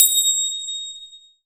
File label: CHINESE PRC, PERCUSSION; AKAI S6000 CD-ROM - Volume 1